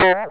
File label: jump; tuxontherun